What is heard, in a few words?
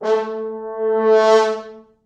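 French horns, four, a2; East West; Quantum Leap Horns Sax; 4 FrHorns fts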